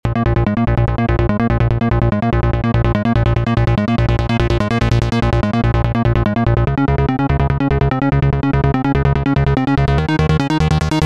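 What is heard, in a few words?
Alien Bass, Bass Dorian A minor; JLS GoaMIDI V2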